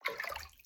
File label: water